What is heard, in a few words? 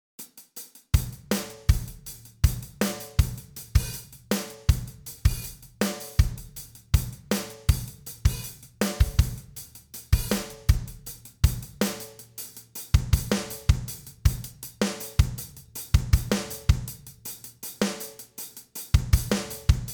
Smash Kit